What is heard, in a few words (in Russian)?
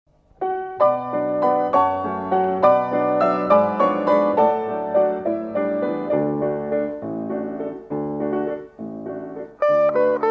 gavayskaya gitara fortepiano Prazdnichniy vals